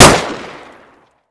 pl gun; GoldSrc

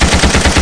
mg fire; GoldSrc

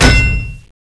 axe world; GoldSrc

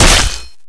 axe cleave flesh; GoldSrc

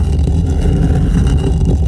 door move; GoldSrc

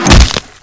wrench flesh; GoldSrc

